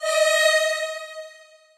choir

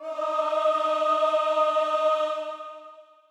choir

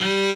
cello

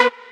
Mainlead Melody

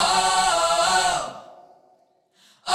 Key chant; Luxembourg Zwee

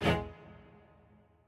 strings

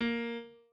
piano

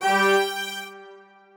strings

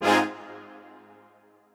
strings

